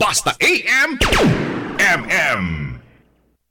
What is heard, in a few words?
stinger